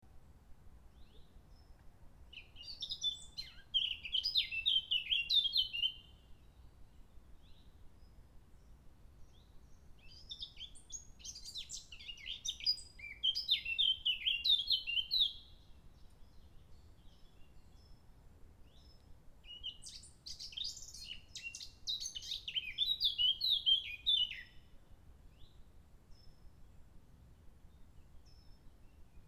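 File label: blackcap, singing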